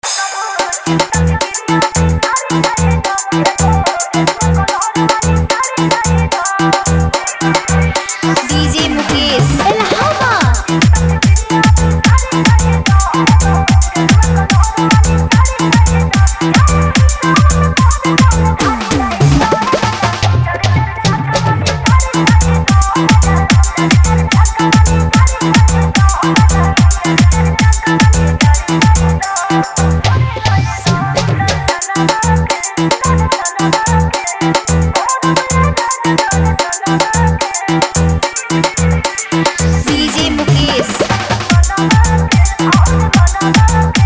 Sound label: Bhojpuri Remix Song